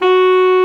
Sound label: ALTO SAX F#4; Greytsounds VOL-1